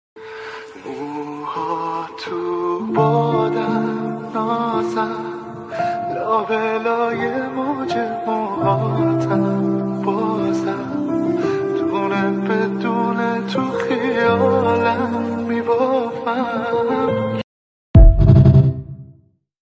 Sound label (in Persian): music, آهنگ کوتاه غمگین ایرانی